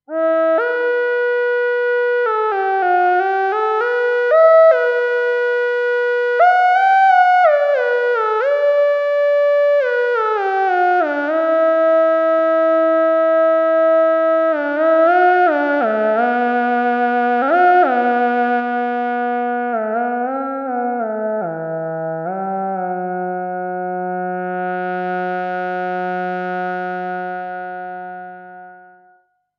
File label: Voice